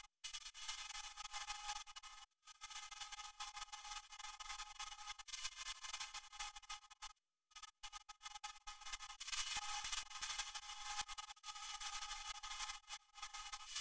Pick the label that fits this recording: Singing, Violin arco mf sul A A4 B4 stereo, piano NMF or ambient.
piano NMF